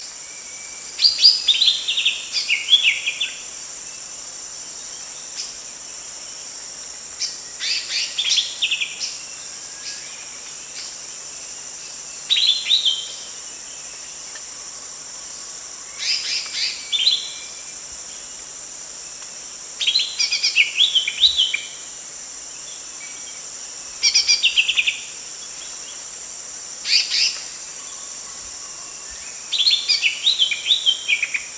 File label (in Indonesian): ci betina